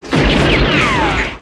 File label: destroy random; eiffelmedia; brick breaker